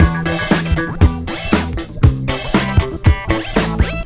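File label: CLAVI D, 118 bpm; USB Soundscan vol.32 - Roots Disco; AKAI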